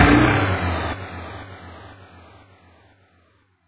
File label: fall; quake2; needleman